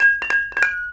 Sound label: KONGWONG